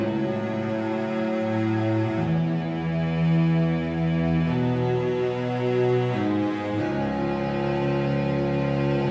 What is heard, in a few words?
song; kz-endo slide svn muffinslides